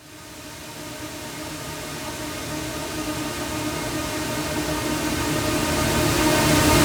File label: 140BPM Darkside D#, Melody Fills; 4Bars; Vengeance Samples